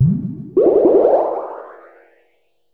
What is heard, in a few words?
AMBNT, 160, ambient